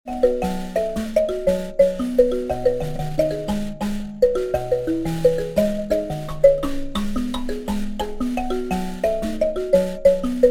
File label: mbira